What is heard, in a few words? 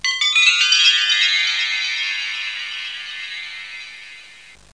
ladder; Leonardo The Inventor; Broderbund; Riverdeep